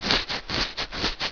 eraser

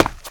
ladder step; wob2 demo

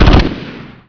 rifle; SpecOps2 MPlayer Demo

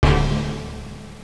program error

